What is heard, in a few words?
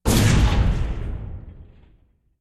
chariot Impact; Puddle